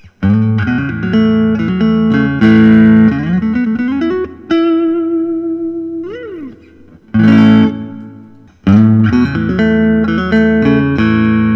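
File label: lentz traditional t sonic blue, guitars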